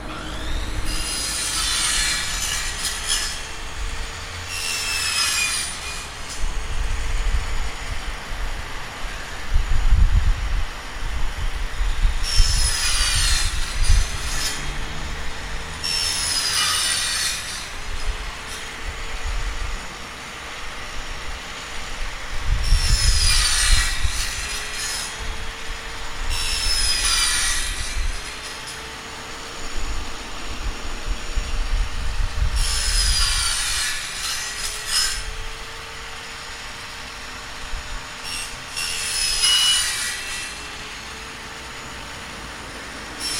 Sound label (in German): Metallarbeit